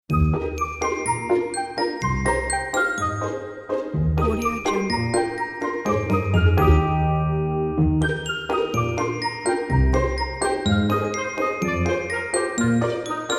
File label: kids game ident